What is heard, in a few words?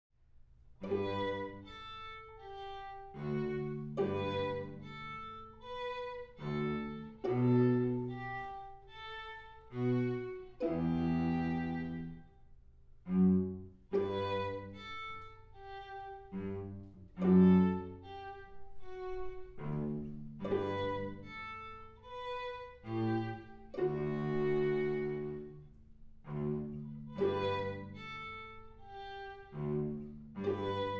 Down the Winding Stairs without Cello